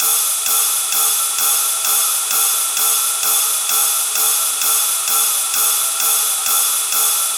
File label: 130 D Ride